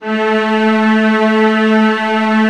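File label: VIOLAS B3, Strings; ILIO; Synclavier Strings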